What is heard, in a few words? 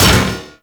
Drone Fall Impact; Grineer